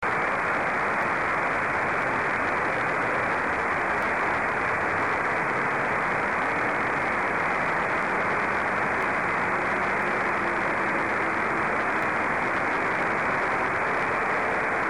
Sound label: ISS